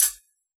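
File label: Hi-Hat Closed, Yamaha DJX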